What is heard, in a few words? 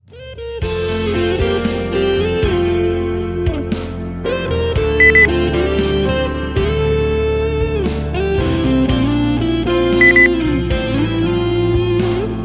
rock